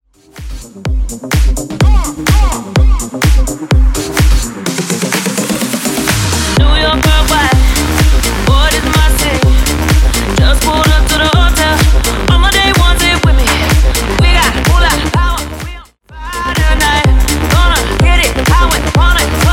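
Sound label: Extended Mix DIRTY 126BPM SNIPPET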